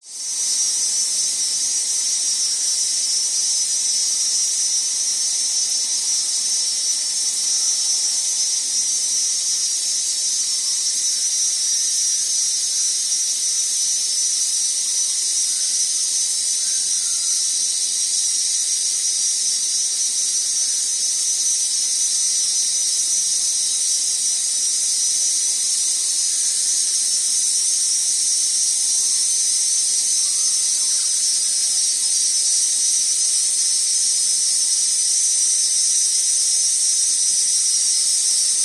ambience rural insects cicada crickets distant birds wind in trees summer; glasshouse